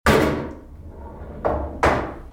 Billiard boule blanche ki revient

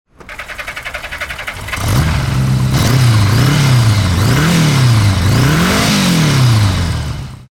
Démarrage grosse moto+acceleration

